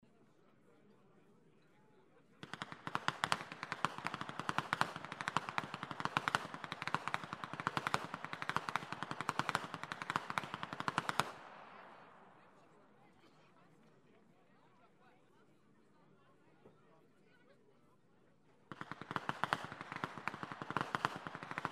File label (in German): schnalzen, flachgau; BERGHEIM I